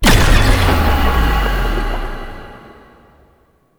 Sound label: Apparition Spectral Impact; Warframe; Dagath